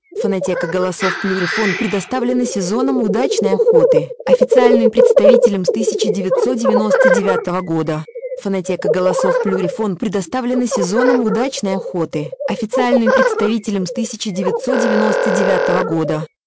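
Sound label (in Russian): тетерев петух, манок; plurifon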